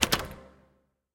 quickstop; spinsane

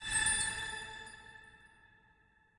hide Gem; wild bazaar

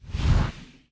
pop left; dazzle

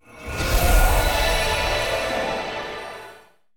random Symbol Transform; fairyhansel

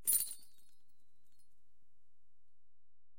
multiplier win; serengeti kings

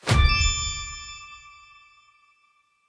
transform Blink; finn

